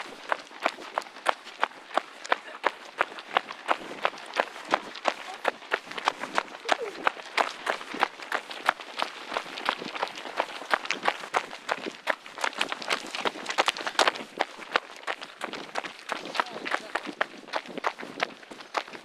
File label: marathon runner